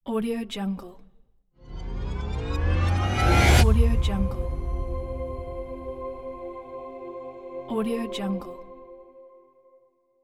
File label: impact logo; denaks